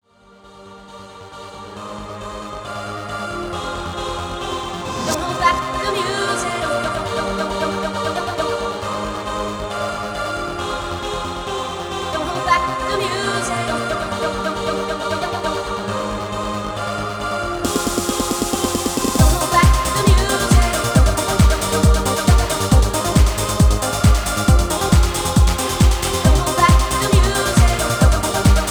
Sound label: Trance Mix